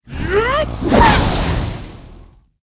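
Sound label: hexscyther attack, monster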